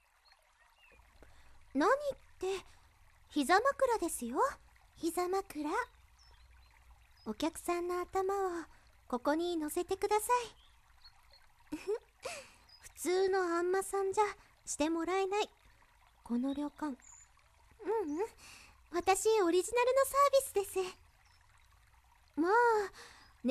膝枕・耳かき; ここなの膝枕・耳かき; 癒やしの旅館 真心亭 ここな編

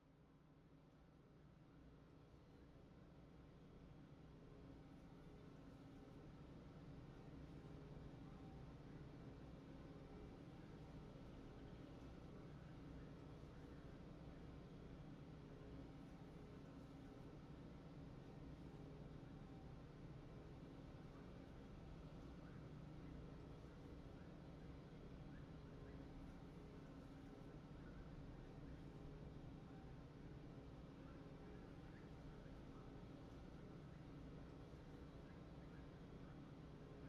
右の波音耳かき（波音のする耳かき） ASMR; まことこまの右の波音耳かき

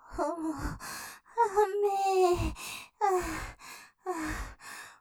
余韻 もうだめぇ, ASMR; ステレオ; ループで楽しむ！シェフの気まぐれ抜き抜きコース

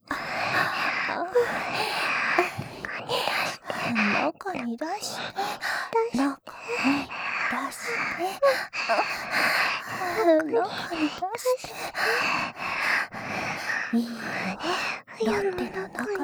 喘ぎ; ロッテ

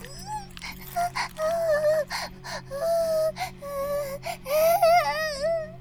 バイブ放置; イクたびにわけて

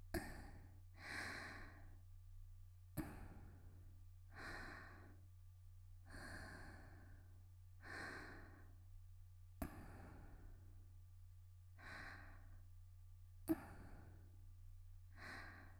（少女43）「息」静かな呼吸, 元気な少女

